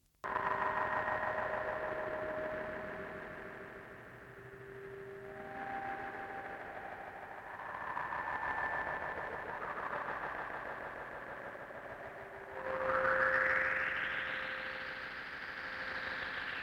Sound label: Storm; Seacucumber